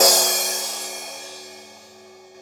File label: Percussion, trap